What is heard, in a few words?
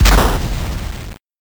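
explosion 8bit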